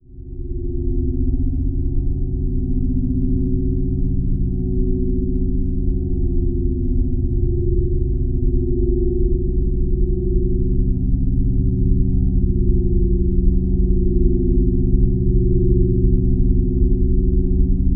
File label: Drone Dark Organ Musical Tone; Production Element Imaging; AdobeCC